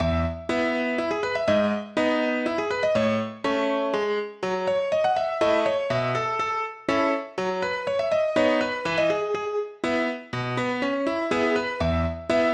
Bagpipe Waltz

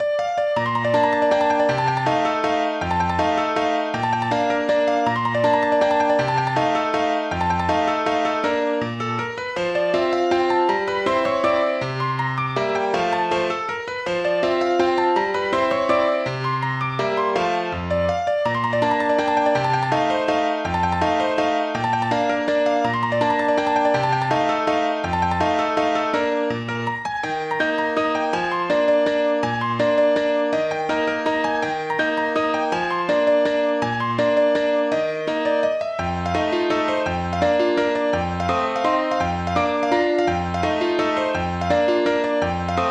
Mazurka; Bella Riva Op.87

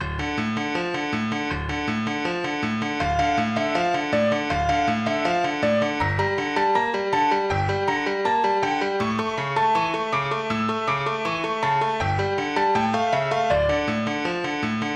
Ancient Folk Song

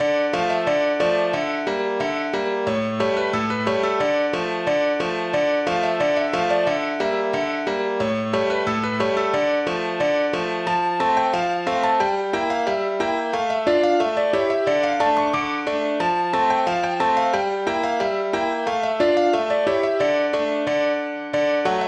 Polka in D minor

